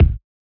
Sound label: Hiphop kick; Reverb+EQ+Comp+Limit